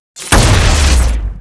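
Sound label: Heavy Tank Rail Gun Normal Attack; blue archive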